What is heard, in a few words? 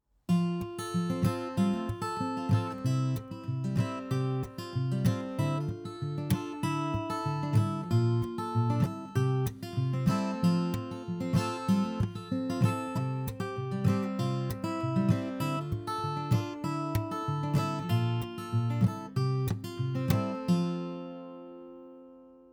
Finger style